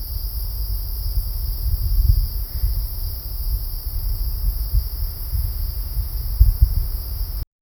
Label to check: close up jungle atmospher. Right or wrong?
Right